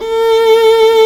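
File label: STRINGS SOLO, Chamber Grp; STR Viola Solo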